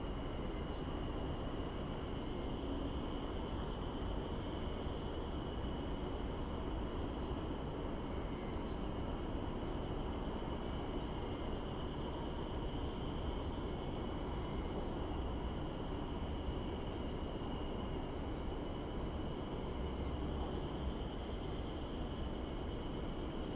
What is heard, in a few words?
indoor postman evening; anemoi